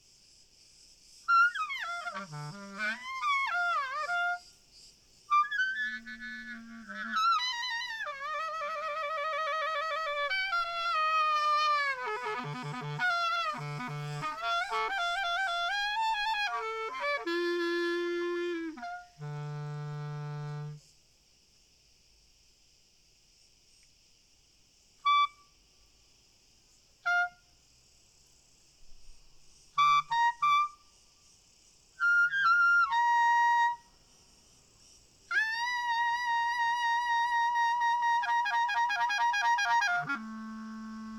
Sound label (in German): Frei O Tn Spiel Grillen Chor Cl Fern